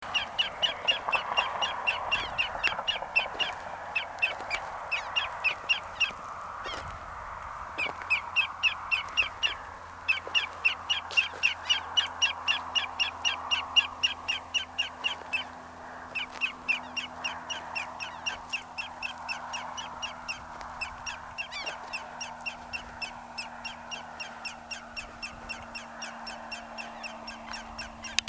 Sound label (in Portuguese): canto do macarico grande de perna amarela